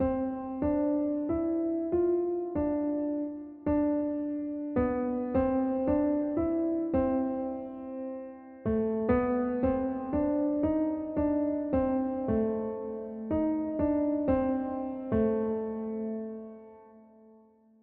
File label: Hound Dog Blues